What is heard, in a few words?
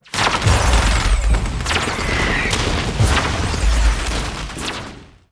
ground battle; Stellaris